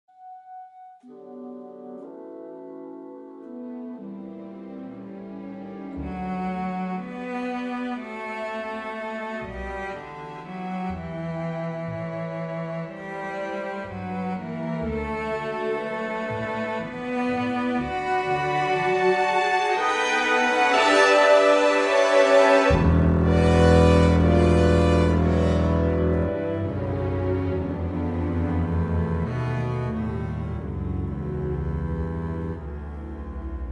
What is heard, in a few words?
Double Bass Concerto